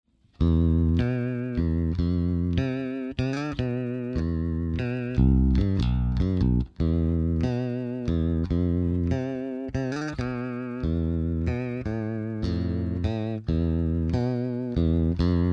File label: Rotary